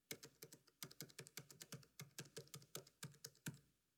Laptop Keyboard Typing; Fairlight Sound Lib

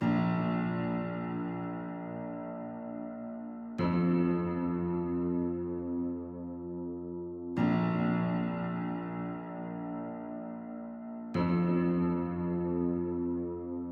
127 BPM F Min Piano; Trap Melody Loops; Loop Stems; Cymatics; Nightmares; Major

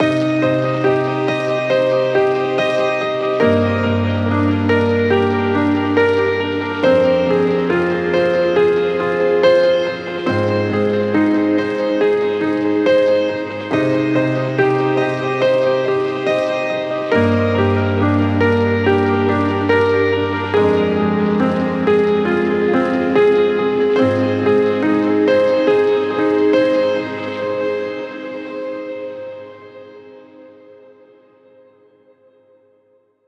Piano - Cm 70BPM; STEMS; Ghosthack; SkyRealm; Mood Kits; Mood Kit 09 - Washed Ashore

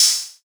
Open Hat 909; Drum One Shots; Hip Hop

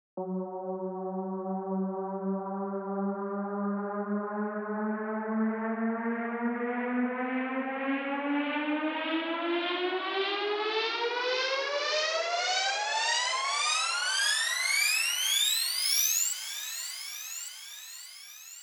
104 BPM F Min Riser FX; Cyber Collection; Edge Runner

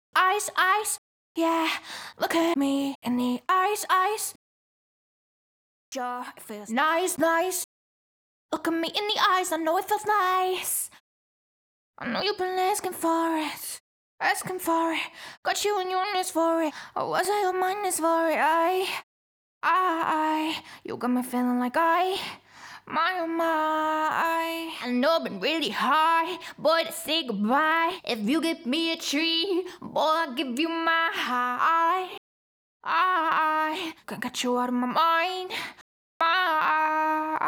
CLEAN DUP R (EMaj) BPM145, Pop Vocals, Acapellas; Ghosthack; Ultimate Pop Vocals

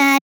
Dry One Shot - C#, Tonal Vocal; Cymatics; Euphoria Vocal Sample Pack

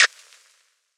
Lofi Snap

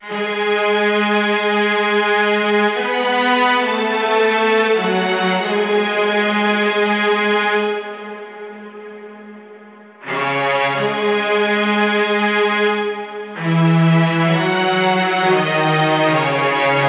String Section Melody - C#m 90BPM; Construction Kits; STEMS; Ghosthack; SkyRealm; Kit 13 - Wanadeers Mist

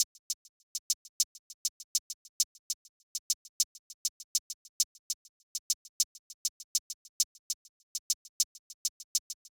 Drum Loop 100 BPM Hihat; Cymatics; Vibes II Drum Loop Collection; Eclipse Drum Loop

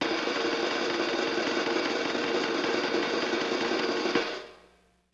LoFi Drum Roll; Vintage Cartoon; Drums & Percussion

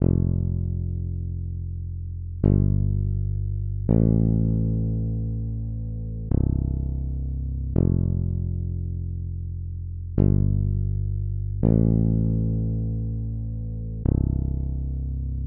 124 BPM C Maj Bass; Melody; Loop Stems; Cymatics; 2022 Melody Collection Platinum Expansion; Peach Tea

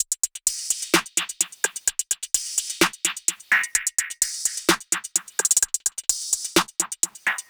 Percussion Loop 128 BPM; Chippy; Hip Hop

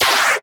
Retro FX; Cymatics; Video Game Sample Pack Vol 1

